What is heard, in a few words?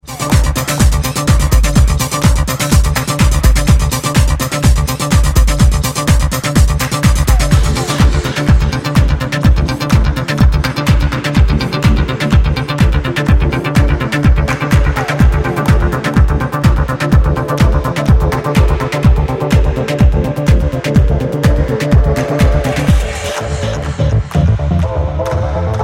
Disco